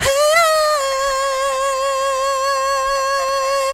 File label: voice heydaheyyyy; Phonky